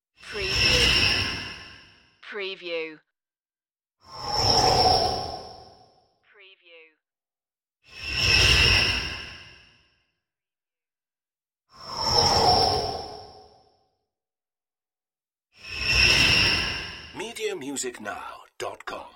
space glider passing

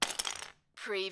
Lego, single lego piece drop onto pile of pieces

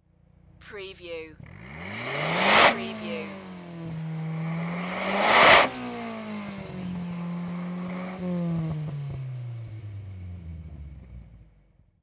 NISSAN SILVIA EXHAUST MULTIPLE REVS

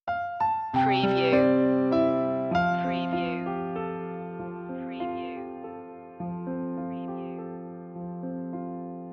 piano loop intro; away in a manger; media music now

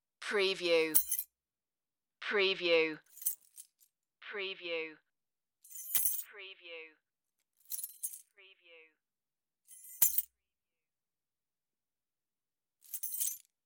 keys on fabric

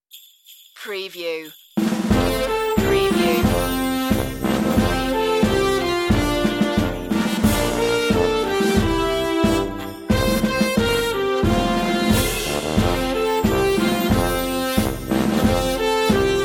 jingle bells marching brass band

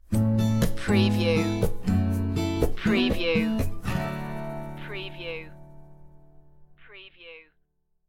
morning light stinger

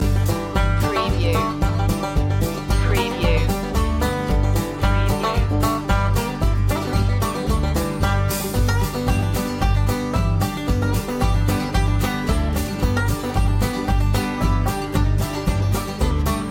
back porch stomp loop

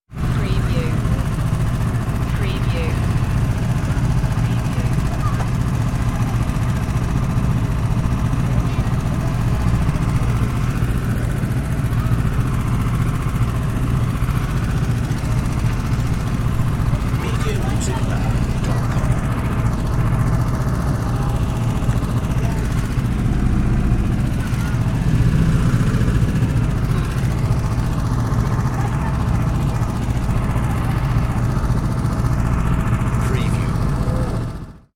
Go Kart Idle

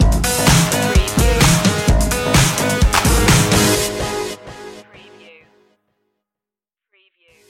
robo-disco stinger

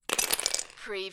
Lego, few pieces drop on floor

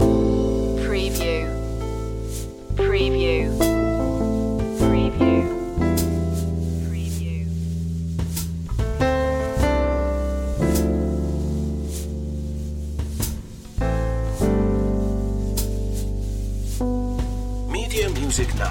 a simple love song loop

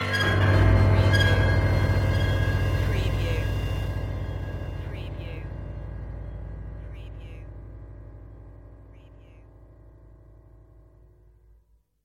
Scare Piano and Metal Stinger

